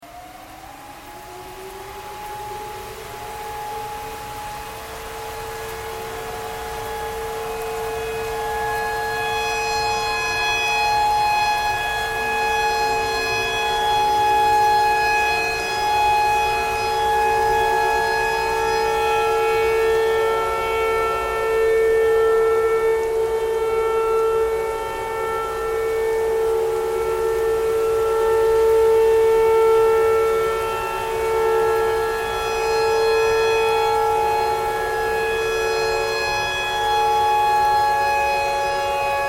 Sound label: tornado siren